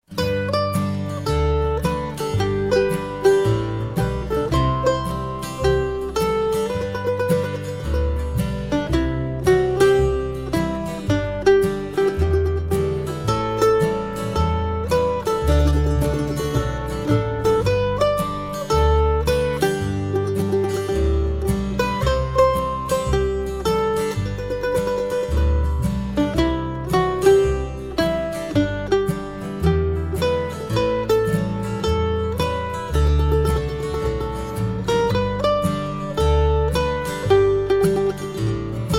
Mandolin

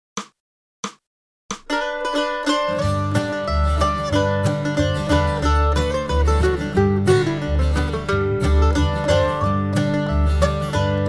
Wildwood Flower G Mandolin 180

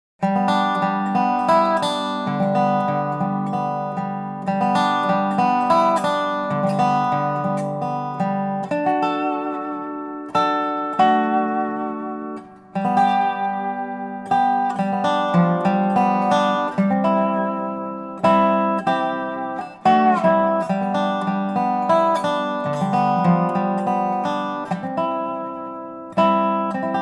Dobro Solo; christmas; Silent Night

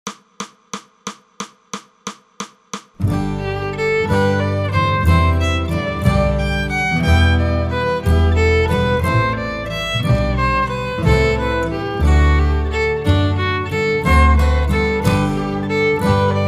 Jesu Joy of Mans Desire Fiddle 180

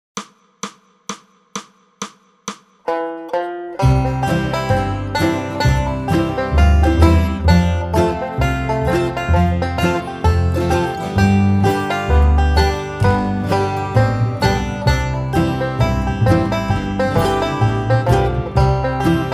Banjo 130; Great Speckled Bird

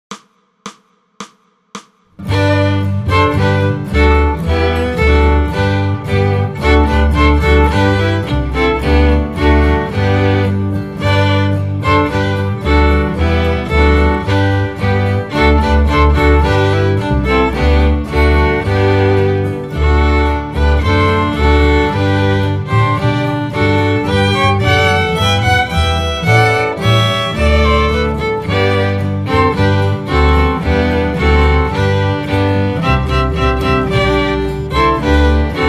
Bass All; christmas; Deck the Halls

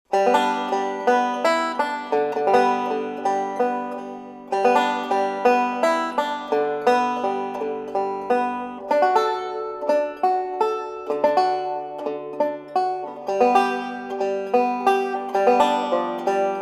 Banjo Solo; christmas; Silent Night

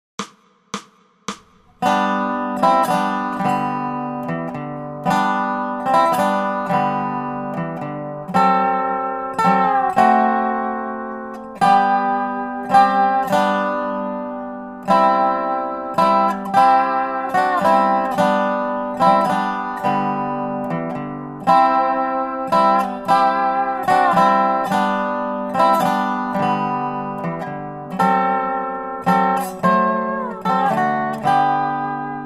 Dobro; christmas; Silent Night

